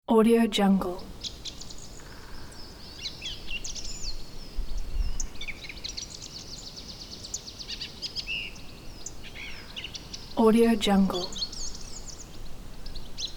birds in forest

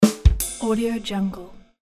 punch line drums

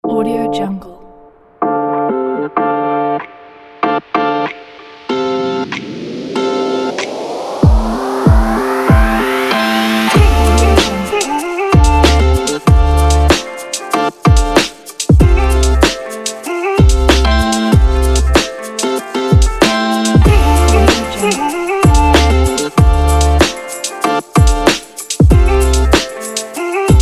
hiphop

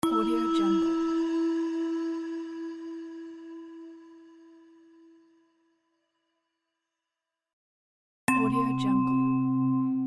tibetan bowls

